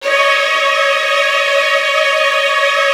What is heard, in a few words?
VN TRILLS, Virtuoso Strings